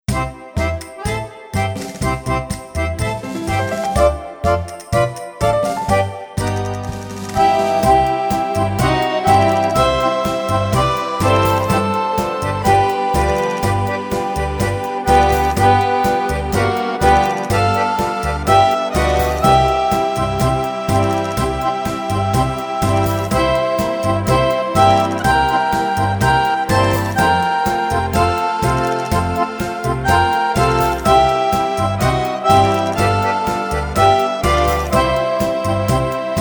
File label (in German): Bandoneon Tango; Wersi